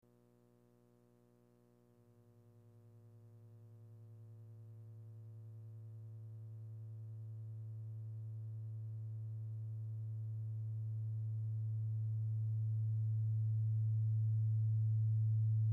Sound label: alarms and sirens